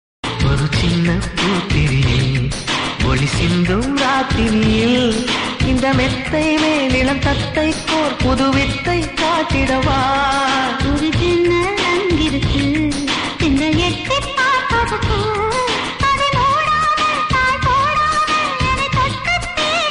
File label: love song ringtone